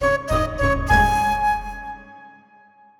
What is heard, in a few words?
Mogo; IndusInd Bank